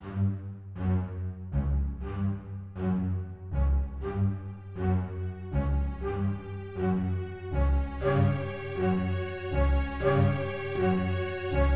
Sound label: Classical Drama